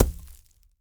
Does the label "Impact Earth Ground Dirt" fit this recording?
Yes